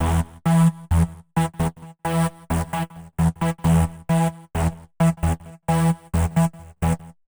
132 House Bass Arp Emaj; Dance DJ; Digidesign; Pro Tools; Pro Tools Essential Loops

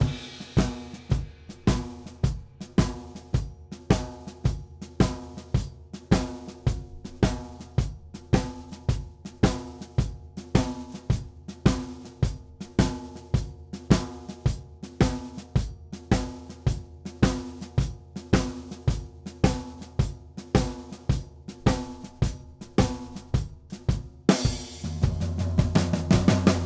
Drums A Sect, Blues; Digidesign; Pro Tools; Pro Tools Essential Demos; Essential Loop Demos; Demo Session

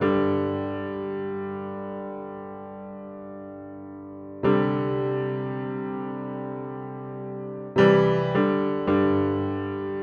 Country Piano, Verse 1; Digidesign; Pro Tools Essential Loops

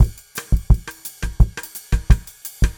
086 Latin Brazil Drums Cross; Pop Rock; Moderate Tempo; Pro Tools Essential Loops; Digidesign

